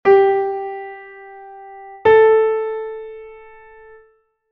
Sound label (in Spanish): tono semitono sol la; tones and semitones